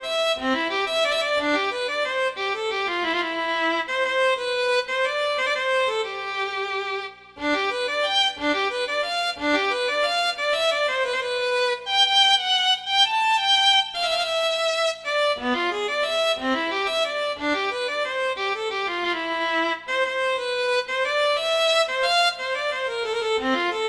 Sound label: Town Tavern